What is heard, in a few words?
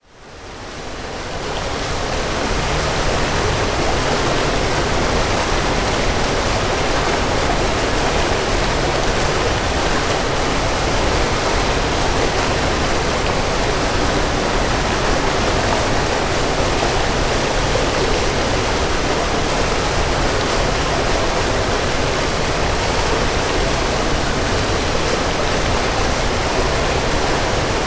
sub; echotopia; soundfellas creative audio studios